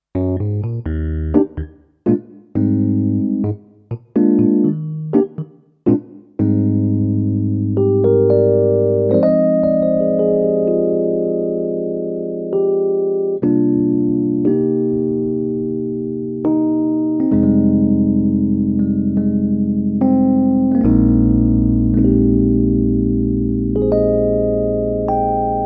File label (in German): Studiologic Numa X GT E-Piano Mark I